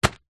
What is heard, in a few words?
foley packet zip ties down in table; zapsplat